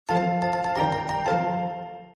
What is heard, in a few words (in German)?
geschenk; Pokemon Let's Go Pikachu; gamesoundopedia